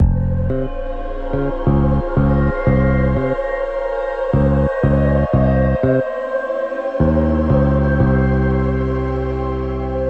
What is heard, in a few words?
golden gate hip hop